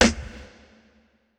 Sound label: Kit, breakcore Drum Hits